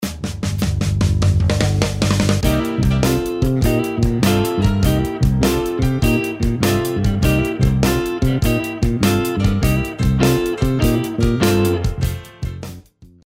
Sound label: blues riffs, blues guitar; Learn 2 Blues Riffs